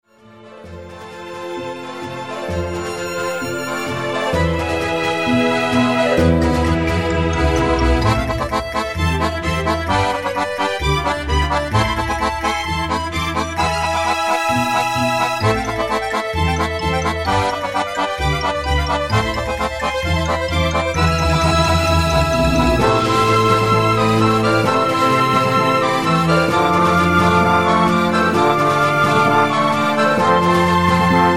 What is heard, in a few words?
Tango